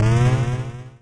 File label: ball hits hole, golf